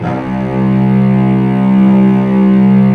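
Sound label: cellos C2, String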